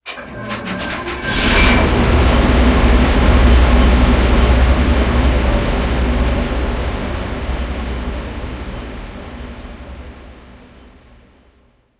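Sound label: panzer6 engine interior start, tanks; War Thunder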